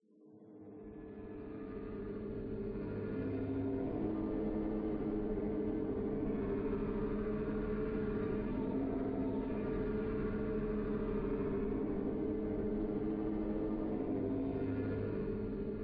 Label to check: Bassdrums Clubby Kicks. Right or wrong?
Wrong